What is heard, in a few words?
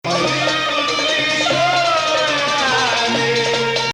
Hijaz; Hijaz 4; "Shuri"